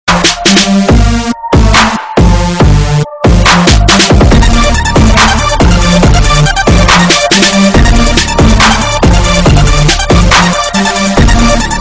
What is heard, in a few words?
Club Beat